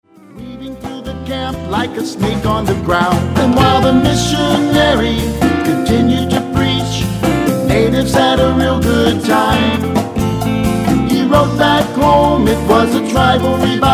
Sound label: Tropical Jazz